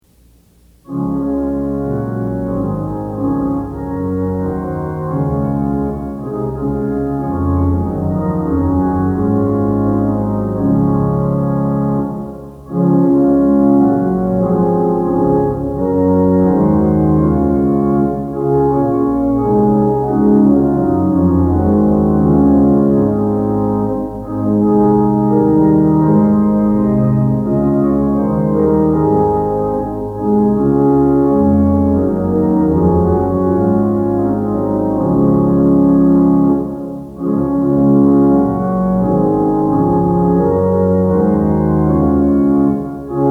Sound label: Organ Music for Worship Services; To Thee, O Lord, Be Glory Given; Twenty-Eight Liturgy Hymns